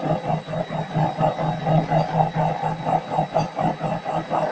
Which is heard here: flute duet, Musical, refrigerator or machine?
machine